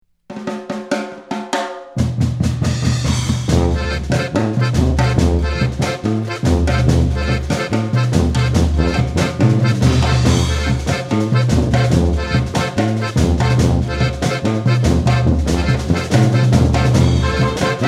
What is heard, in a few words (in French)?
Fanfare des pavés